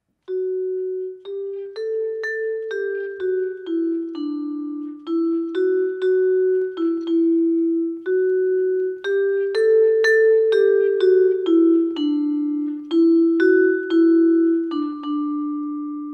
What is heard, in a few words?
Vibraphone